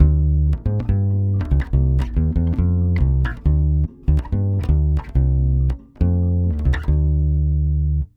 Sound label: BASS GROOVE, Brazil Bossa; USB Soundscan vol.16